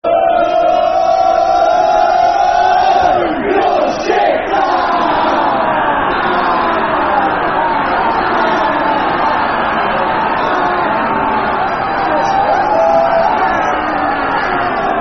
Goal Kick, Football Chant; Soccer Songs; Cheltenham Town Ctfc

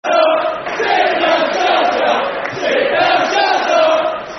Sit Down Shut Up, Football Chants (Soccer Songs); Portsmouth

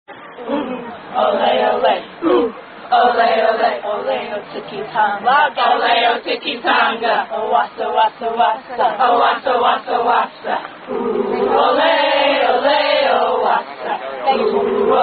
Unknown Chant, Women Sing Before A Game; UIC

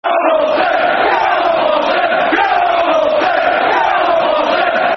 Come On Bolton, Football Chants (Soccer Songs); Bolton Wanderers Bwfc

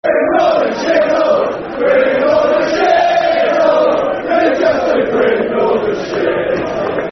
Football Chants, Plymouth Argyle; Grim Northern Shithole; Plymouth Argyle Pafc

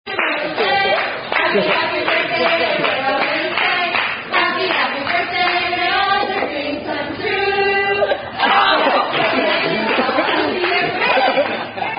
Birthday Creaming [Aka Happy Happy Birthday]; Chisholm's

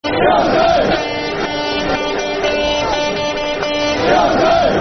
Horn Chelsea Football Chants; Soccer Song

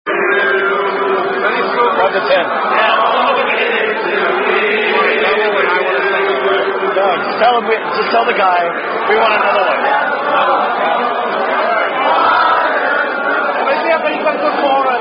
Sing-Along, Unknown Song Sung By Rugby Players; Bingham Cup 2008

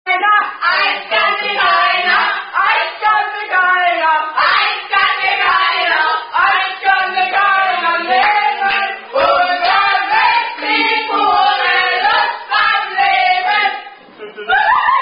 German Attack Song; German Baby Shark Attack Song And Two Other Fragments

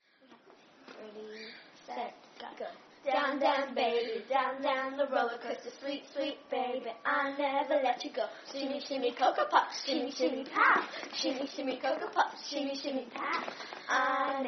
Clapping

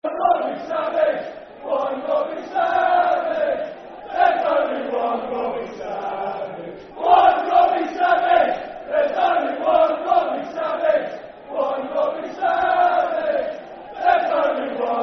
Football Chant (Soccer Song), Brighton; One Robbie Savage; Brighton Bhafc

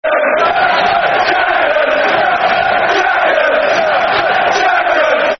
Chelsea, Champions, Football Chants (Soccer Song)

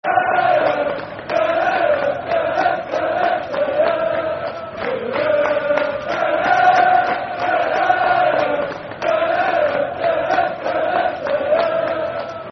De Der, Football Chant (Soccer Song); Aldershot Town Afc

